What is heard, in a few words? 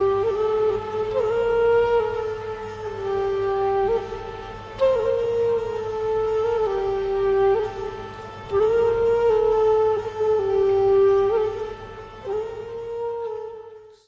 Ringtone Flute Dhyan; hariomaudio; instrumental; Shivratri